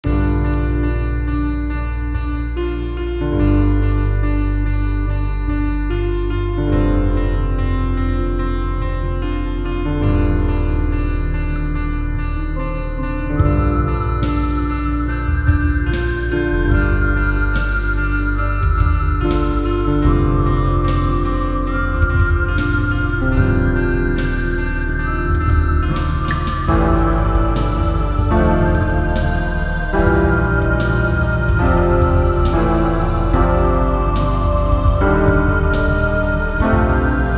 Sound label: Up Beat; Up Beat version 1